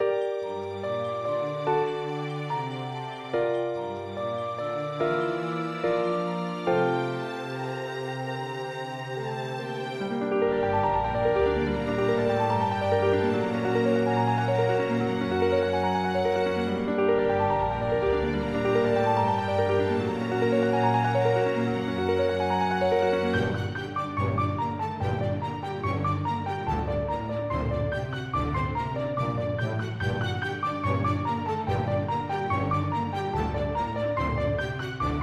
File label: advert car